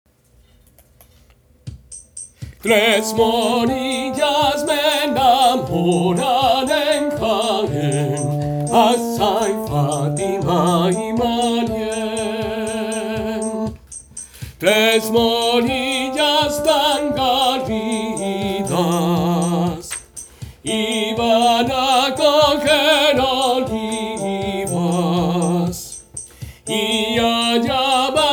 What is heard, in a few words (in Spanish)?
2 VOZ; Tres Morillas